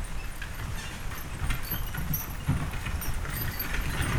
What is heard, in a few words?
Horse Drawn Wagon By; Electa Quinney